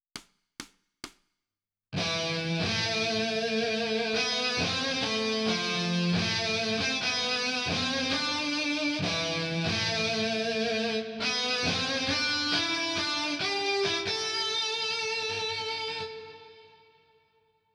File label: Melody